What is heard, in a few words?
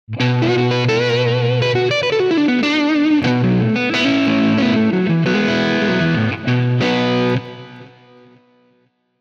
Dirty Rhythm